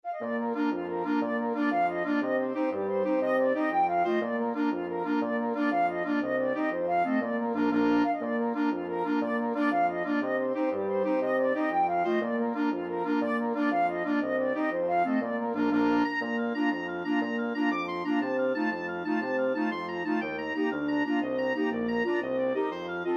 irish jig medley, flexible mixed ensemble 6 players